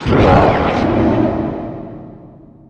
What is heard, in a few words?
spit; satan